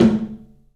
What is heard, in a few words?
TOM, 3D Percussives; E-MU Producer Series Vol. 5 – 3-D Audio Collection; PA Toms